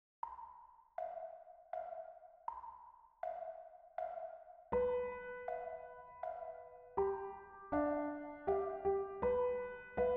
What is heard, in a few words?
melody, guitar